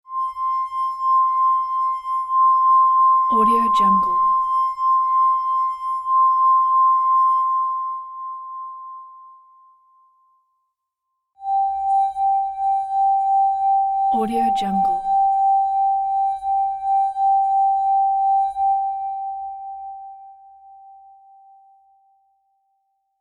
wine glass horror resonance

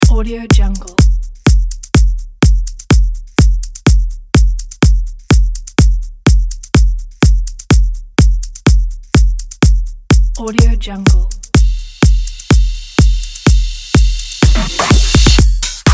beat; alversmusicproduction

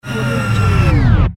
stop effect

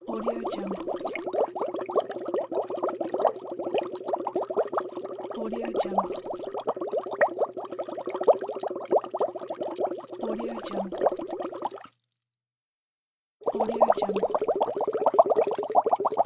bubbles; blackdragonfx

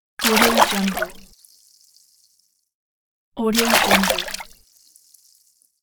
fall water

